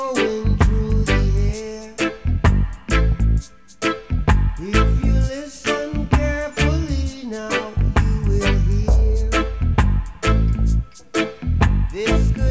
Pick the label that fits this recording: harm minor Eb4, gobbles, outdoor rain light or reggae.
reggae